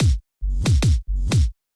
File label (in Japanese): Kick, リバースキック, キックに吸い込まれるような; キックの音を逆再生し; 「ゥゥゥウウウワッ！」という吸い込まれるような音にしたもの